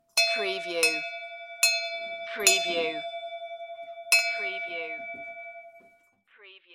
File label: Bell Ring Multi